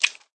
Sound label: click chink